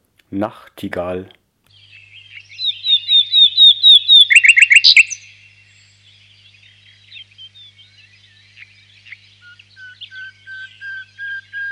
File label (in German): nachtigall; Gesänge bzw. Stimmen